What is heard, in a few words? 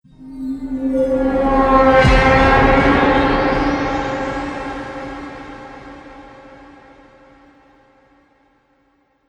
Trailer Hit Scifi Metallic Impact